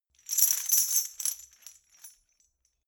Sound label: metal chain handle clean